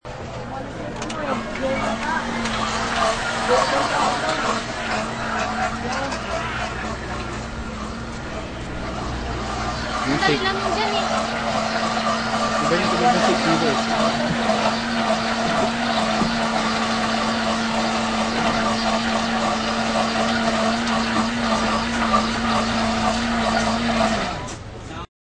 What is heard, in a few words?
exiting shakes